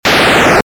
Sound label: explode; wyrian